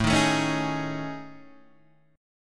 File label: A Major 7th Flat 5th, guitar; AM7b5 Chord {5 6 7 6 4 4} strummed